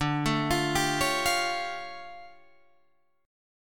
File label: D-Minor Major 11th, D, Guitar, Drop D